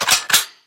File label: m249 switch, guns